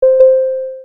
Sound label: din ding